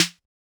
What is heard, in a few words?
Snare Closed; SSO Snares